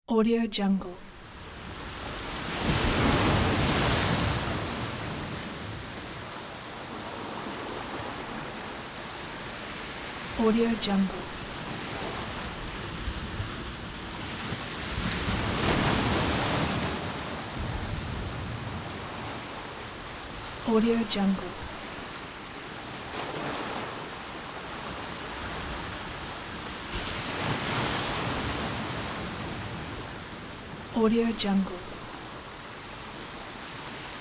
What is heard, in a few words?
ocean waves